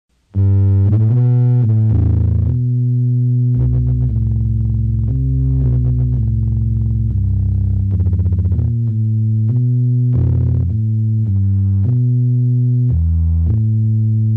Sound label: bass